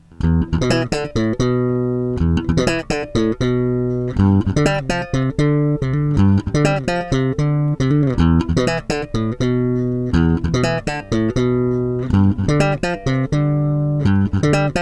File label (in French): custom micros simples eq plat, basse